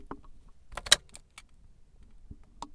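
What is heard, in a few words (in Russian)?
поворот ключа от машины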